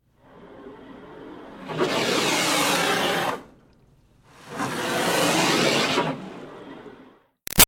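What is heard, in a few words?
Water Spray Interior Car Grill x2; Car Wash; BigRoomSound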